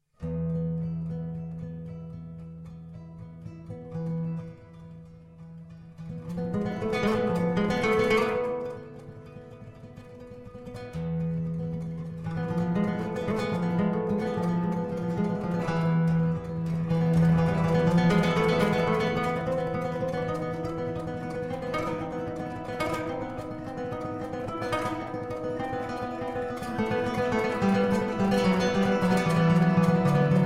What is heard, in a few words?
Rumba